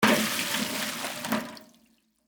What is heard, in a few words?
Water Pour Bucket, Into, Metal, Large; Pouring Sound Effects